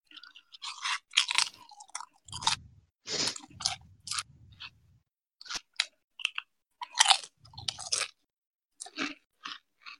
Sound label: crunch crunch